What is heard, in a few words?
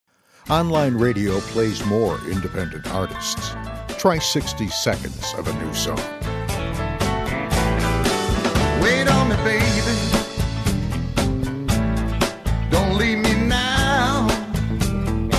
country